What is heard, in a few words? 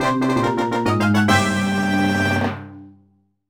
Perfect Score in Mini-Game; new super mario brothers